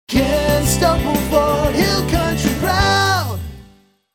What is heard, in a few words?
JINGLE ONLY